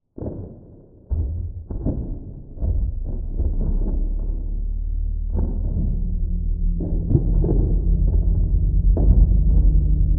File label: AMBIENT; eskimo